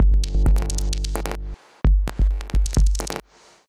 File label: Kit groove 130bpm; MIDI Clips; 122 - 130 bpm; Onomatopoeia Kit; Ableton; Factory Packs; Glitch and Wash